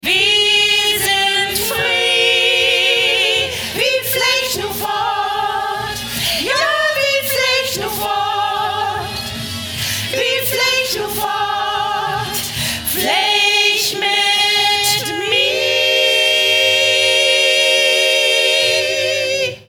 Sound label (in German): klingelton